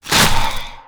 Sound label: VAMPIRE DEATH